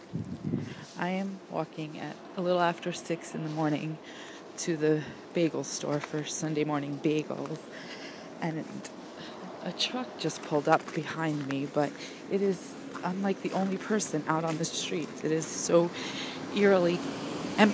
sunday morning babble

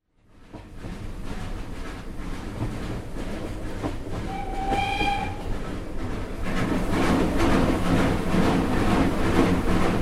entering Summerseat tunnel

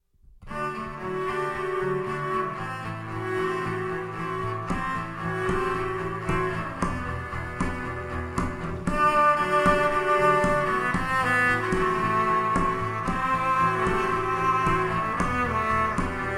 played by electric cello plus looping pedal

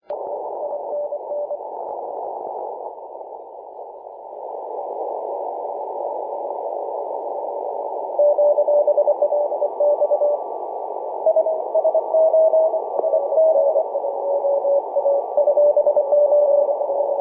cw, 14 020mhz

